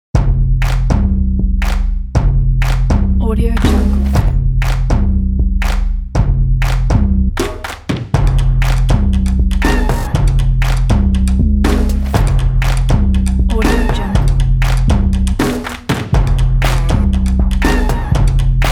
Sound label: drunk street percussion hip hop; flangermusic